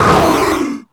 pinky pain, monsters vocalizations; DoomRTX

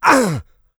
pain large, player; Doom

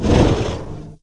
breath, strogg; Quake 4 Alpha; id software; tik

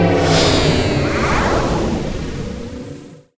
forcefield powerdown; Quake 4 Alpha; id software

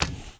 drag step; DoomRTX

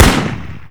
machine Gun Single; DoomRTX; gun revised

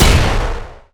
blaster; Quake 4 Alpha; id software; strogg; tik

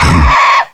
pain, strogg; Quake 4 Alpha; id software; tik

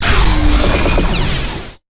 valkaryne lean attack; Quake 4 Alpha; strogg

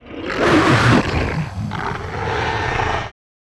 pinky chatter combat, monsters vocalizations; DoomRTX

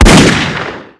Pistol shot short; Foley; Doom

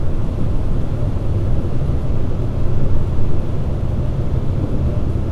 speeder bay amb; Quake 4 Alpha; id software

